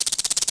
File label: insects, ambient savanna; World of Tanks Blitz